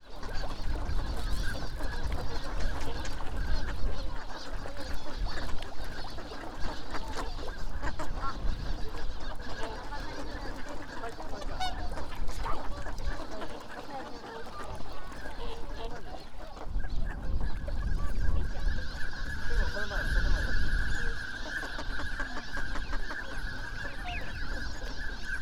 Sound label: abukuma duck